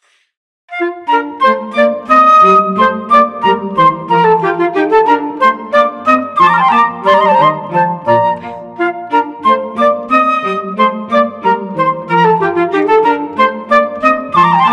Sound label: Classical Trio; Fiesta Classical Trio; Elegant Classical Trio